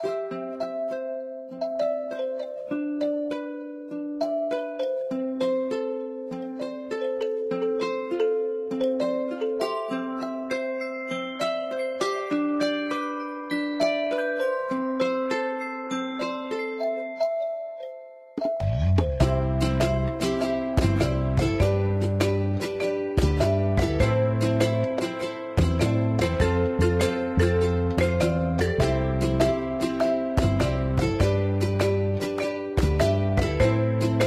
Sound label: music Girl room